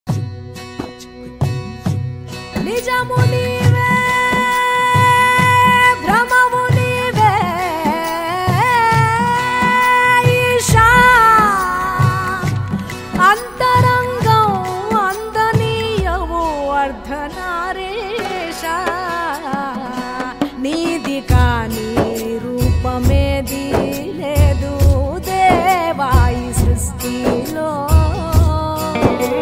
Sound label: Shivaratri Song; Telugu Private Songs